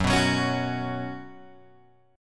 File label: F sharp Suspended 4th, Guitar, Open G